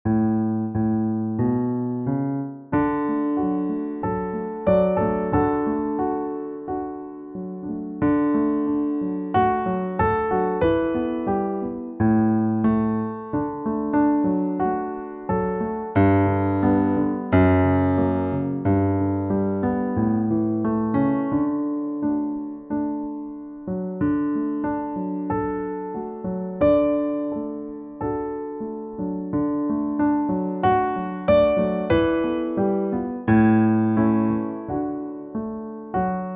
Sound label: My Love is but a Lassie Yet Piano